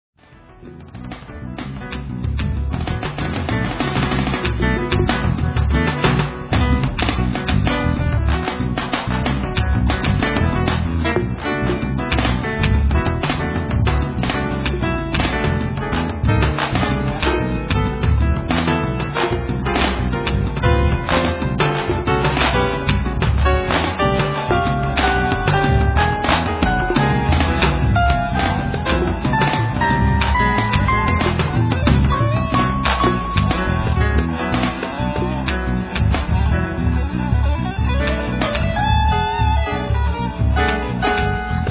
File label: Beat